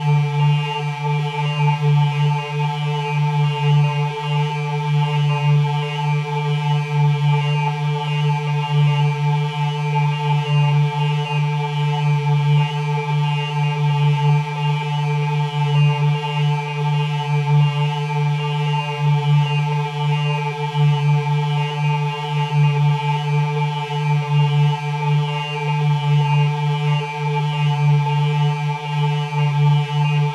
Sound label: Atmosphere transmission offline; sumo blanco